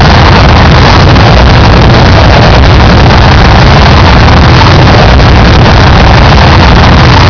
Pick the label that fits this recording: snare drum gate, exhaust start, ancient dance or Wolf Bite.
exhaust start